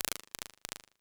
chorus taps R; Experimental; Ableton; Convolution Reverb

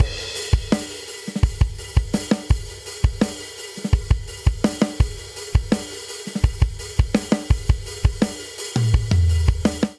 Straight Seven Rock Outro 7/8 84 bpm, drum; Ableton; Factory Packs; Drum Booth

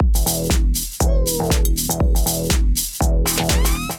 kit groove 120 bpm; Miami Heat Kit

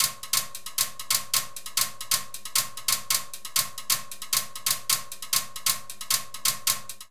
Rhumba Timbales 135bpm; Latin Percussion; Ableton; Factory Packs

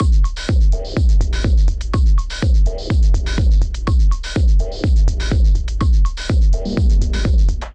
Kit 124bpm; Coping Kit; Ableton; Punch and Tilt

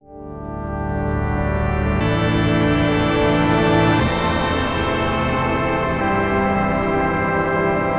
Detuner Pad, Synth; Ableton; Factory Packs; The Forge by Hecq

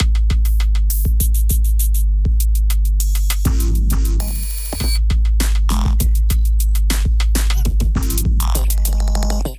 Pan Sonar Kit groove 100 bpm; MIDI Clips; 89 - 120 bpm; Ableton; Glitch and Wash

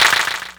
Clapbox Pitch 6; Ableton Foundation FreePacks

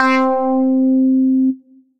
Synth Lead; Mighty Might; Ableton; Beat Tools

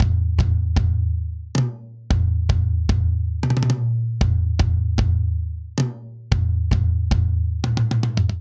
Polyrhythmic Dance Intro 114 bpm; MIDI Clips; Ableton; Factory Packs; Drum Booth

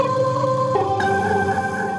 Strange Voices, Transformed Voices; Voice Box; Chromatic Voices

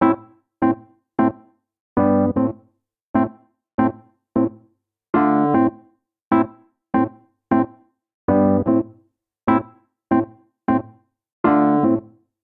Vamp Dub A Minor 76 bpm, Electric Keyboards; Ableton; Factory Packs

